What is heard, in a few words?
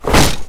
damage; hugh